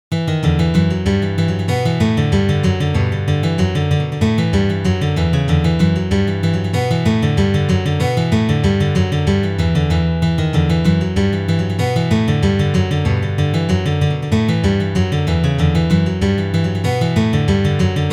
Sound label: Thunderstorm, The (Reel)